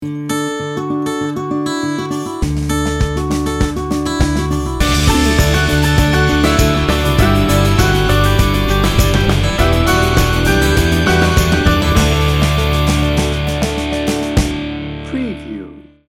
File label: americana morning